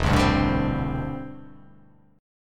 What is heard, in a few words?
E Minor 7th Sharp 5th E; strummed